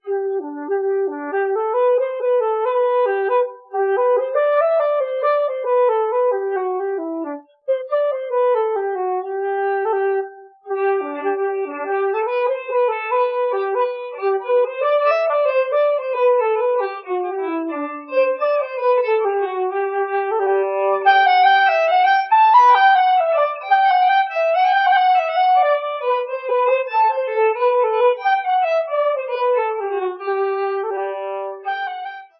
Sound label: Flute